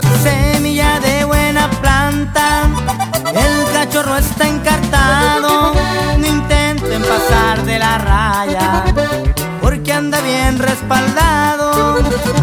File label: Corridos